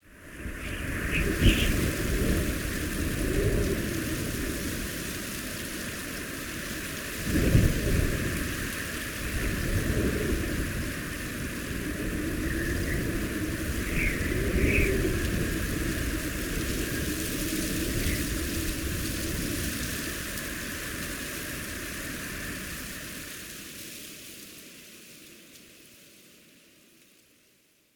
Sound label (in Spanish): viento verano sonidos agradables